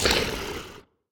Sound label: grunt; fenrir